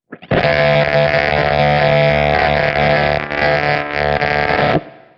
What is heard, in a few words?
dying amp e chord, guitar noizes